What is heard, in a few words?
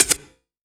Yamaha CS1x Drums